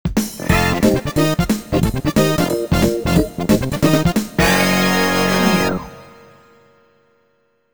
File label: 2nd 3rd Place; Mickey's Speedway USA; N64